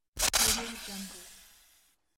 snake strike

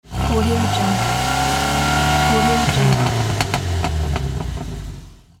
car revving; kotanjian

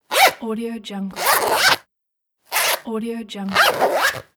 short small zipper

